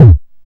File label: BD ANALOG; Sound & Vision - Gigapack II CD 2